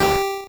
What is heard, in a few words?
squish; earthnight level